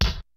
kicks snare, kid; Kid Nepro; Elite; KICKS SNARES

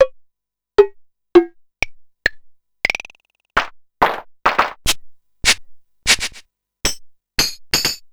Simmons MTX9; FactoryB

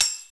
PER TA, Percussion Tambourine

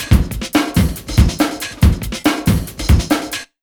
RUN 140, Big Beat Drumloops; USB Soundscan vol.25; AKAI

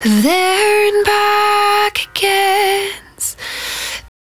110bpm Ab, Velvet Vocal; Zero-G

